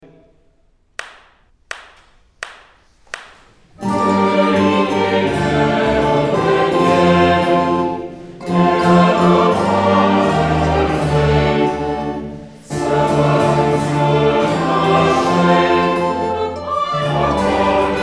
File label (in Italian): coro